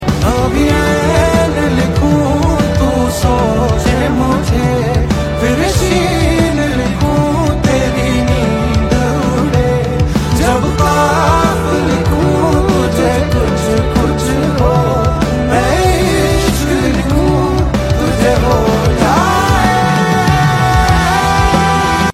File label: Ringtone